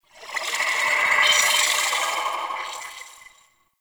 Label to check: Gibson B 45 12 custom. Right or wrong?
Wrong